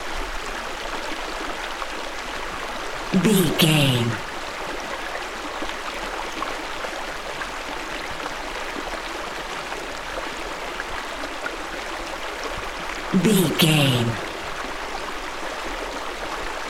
river stream night flowing water loop long version